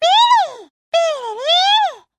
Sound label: thunder